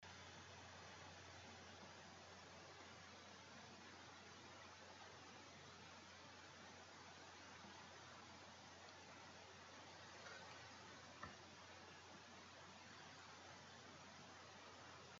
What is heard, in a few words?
internal mic; usb mics background noise hissing